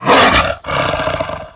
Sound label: roar; The Elder Scrolls III - Morrowind; were